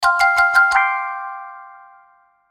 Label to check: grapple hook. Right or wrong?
Wrong